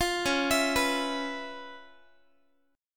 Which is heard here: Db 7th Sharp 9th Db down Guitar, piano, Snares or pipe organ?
Db 7th Sharp 9th Db down Guitar